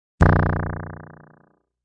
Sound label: Squiggle; Squiggles